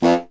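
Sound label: wind contrabassoon; Miroslav Vitous; Solo Instruments VOL-1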